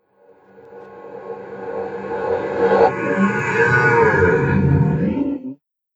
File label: Haunting Monster Sound; Ghost Sound Effects; Andrew Fleming